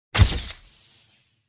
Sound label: camera button